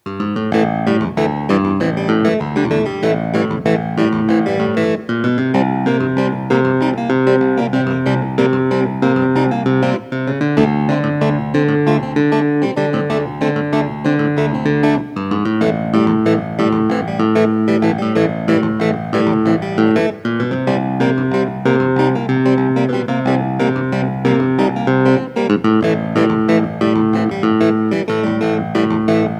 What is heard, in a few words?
Hohner Clavinet D6 Medium